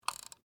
Mouse Wheel Move, Technology, Mouse, Wheel, Movement, Wireless, Laptop, Mouse; Computer Sound Effects; BlastWave